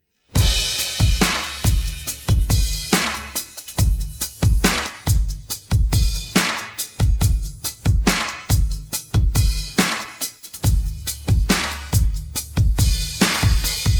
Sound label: pattern, drums